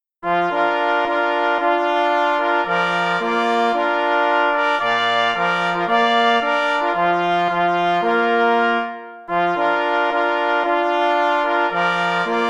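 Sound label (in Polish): Hejnal, rogi mysliwskie; J. Gocalinski; Hejnał Myśliwskiego Klubu „Hubertus” w Warszawie; Naczelna Rada Łowiecka; Janusz Gocaliński (1957-2023)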